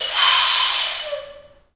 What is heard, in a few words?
AF FLUTES, Heart of Africa; Spectrasonics; Heart of Africa 1